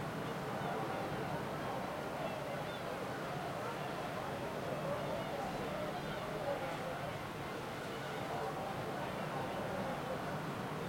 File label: Amb Opening